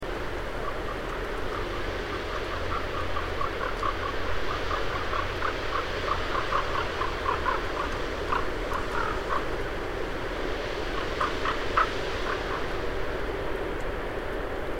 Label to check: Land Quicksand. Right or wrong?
Wrong